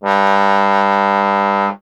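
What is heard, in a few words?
bass trombone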